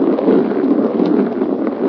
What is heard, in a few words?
paint can roll loop, metal; physics; Source Engine; cstrike